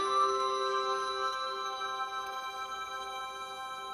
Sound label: magic sparkle